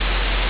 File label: ambience room tone